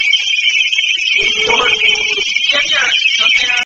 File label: Unknown scream; St Joseph Cemetery; Spirit Chasers of the Unknown; EVPS